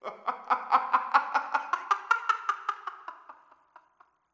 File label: Laughing